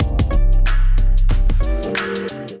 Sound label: kit groove 84 bpm; MIDI Clips; Gotta Feel Kit groove; Ableton; Factory Packs; Beat Tools